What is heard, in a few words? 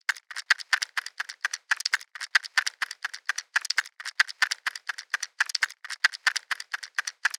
Plastic Dice Toy Shake FX Loop